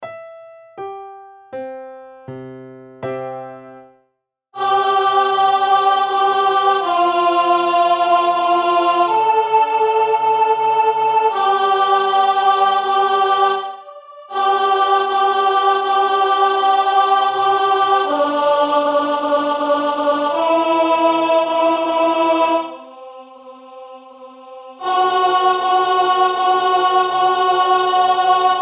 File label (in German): Largo Alt; Salve Regina